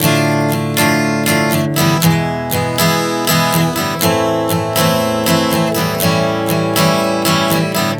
D-E-Am-A7 120 bpm, acoustic guitar chord progressions